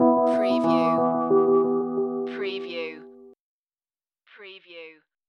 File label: Hip Hop Dark Rhodes Licks 90 BPM Straight - E